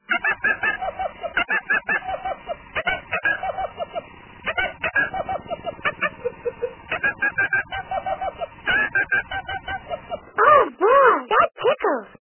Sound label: tickle; elmo